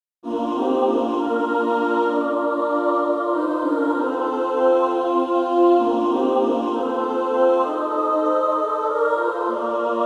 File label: Female Choir; Jesus Lover of my soul; SSAA